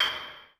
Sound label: Dance Kit, Korg 01W